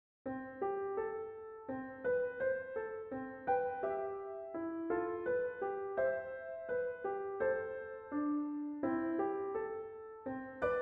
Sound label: Kalimba